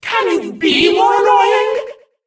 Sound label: mon hurt vo